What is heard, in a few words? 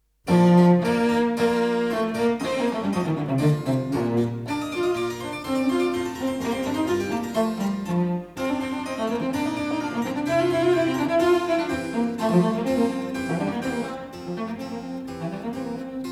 Allegro; Vivaldi